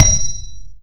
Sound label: concrete